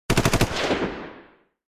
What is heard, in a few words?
Powerful machine gun short burst